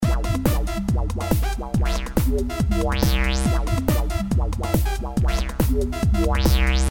slime music disco mode; Adventure Time - Elemental; ThrillGames

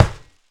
ball kick post; Cartoon Network - Toon Cup 2020

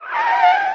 skid; Gotham City Speed

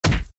enemies get hit; Adventure Time - Break The Worm; ThrillGames

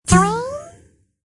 bear pop; Danger Mouse - Ultimate

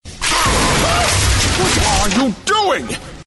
Tentacle Disable; Ben 10 - No Arm Done

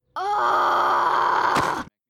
fail; Boss Baby - Matching Pairs

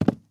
land soft wood; Ben 10 - Escape Route

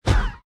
boss lich get hit; Adventure Time - Break The Worm; ThrillGames

